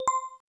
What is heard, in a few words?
hot; LG GD900 Crystal; Keytone sounds; Piano